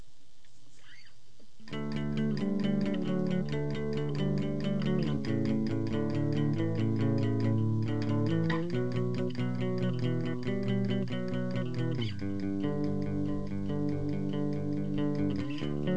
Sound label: intro bass cover